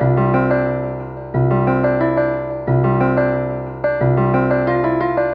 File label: Fx Piano; Hip-Hop